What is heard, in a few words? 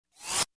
Short noisy riser